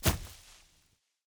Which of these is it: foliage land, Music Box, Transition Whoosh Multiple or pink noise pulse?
foliage land